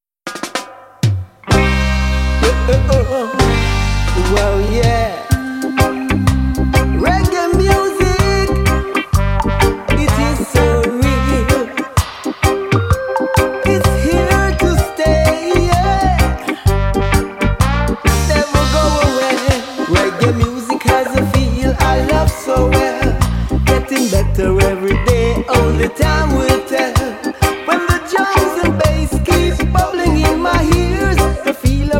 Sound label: Reggae Music; roots